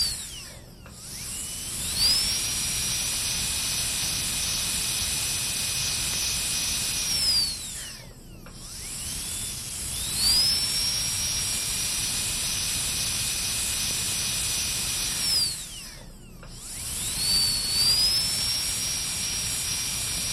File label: Dentist Drill